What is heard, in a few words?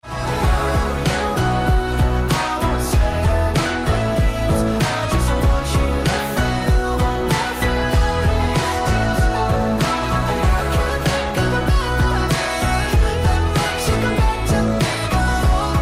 Piano ringtone